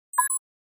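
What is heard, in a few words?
Flash Beep Hollow Electronic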